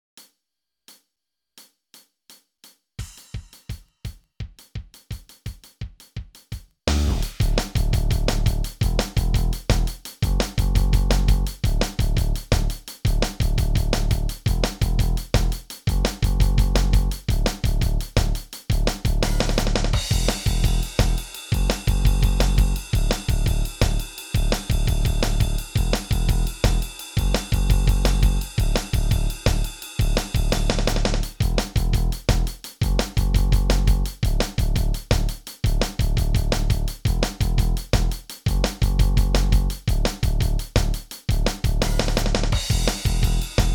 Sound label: Backing Track D major 170 bpm